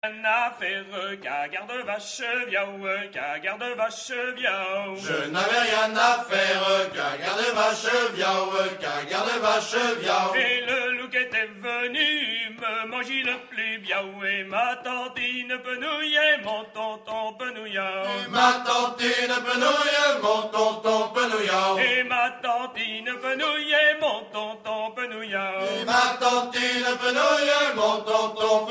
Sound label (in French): chants a danser du pays d oust; hanter-dro